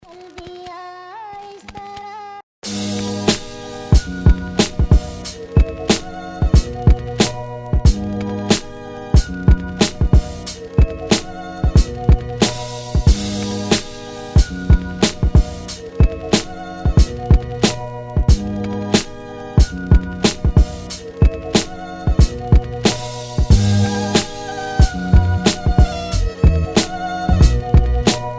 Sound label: Outro Instrumental, Bass, Punjabi Bhangra